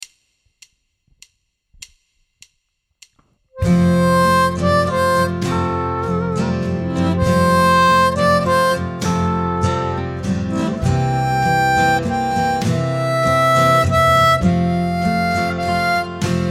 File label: F 100 harp